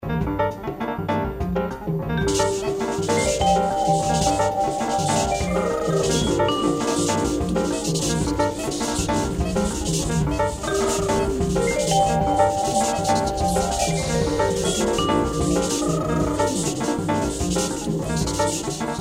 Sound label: Collage Music